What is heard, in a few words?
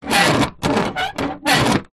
vehicles truck rear door metal latch lock turn grind